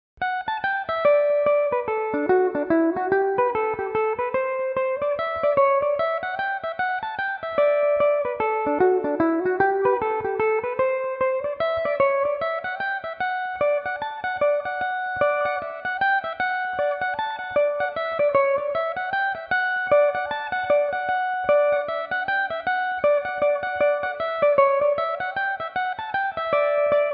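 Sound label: D major belmuse, irish mandolin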